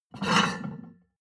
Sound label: plate on plate take slide hard